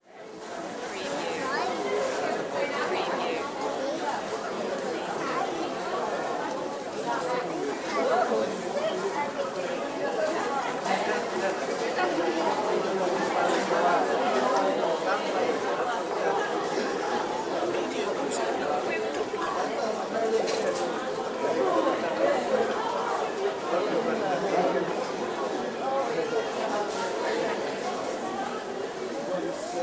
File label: airport arrivals hall in crowd